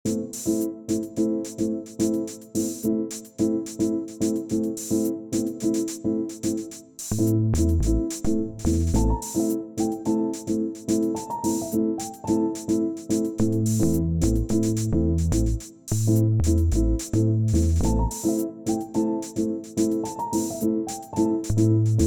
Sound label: jazzy spy loop